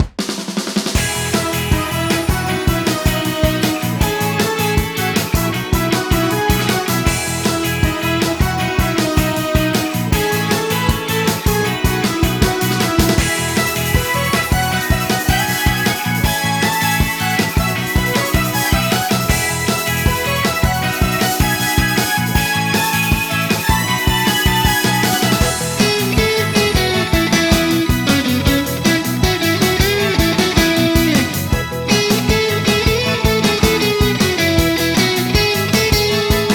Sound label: B Menu Event